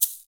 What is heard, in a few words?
shakers